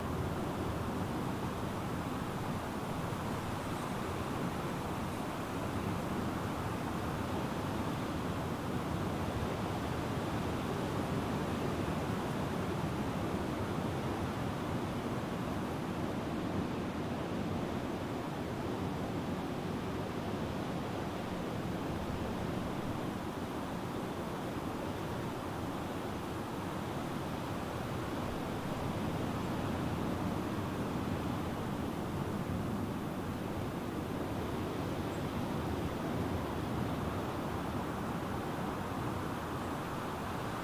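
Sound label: outside loop egypt; The Sims 3; Windows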